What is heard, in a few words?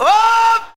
"oh", group of male sung; ooh ahh